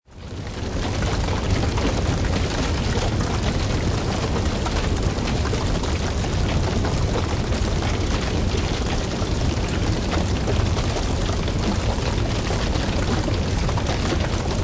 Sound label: Fire Wood Crackle Break, Crackling wood fire, wooden house burning down, large fire inferno.; Great stereo definition; Fireplace; Lynne Music